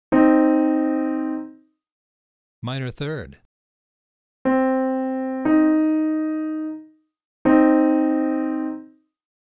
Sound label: Minor Third Example